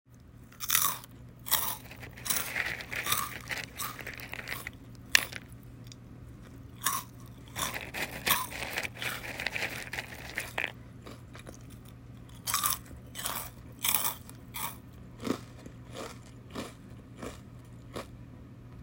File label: Eating